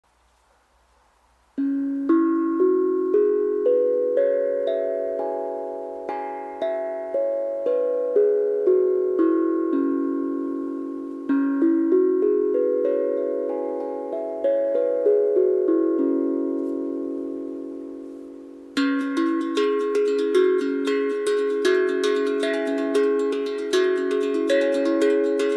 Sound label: C Minor Pentatonic scale